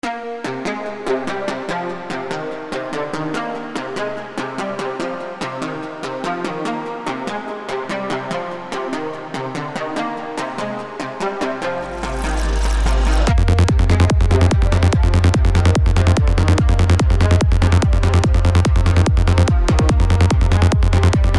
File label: psytrance